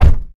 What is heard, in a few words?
car door close; NS Studios - ultra sound collection; vehicles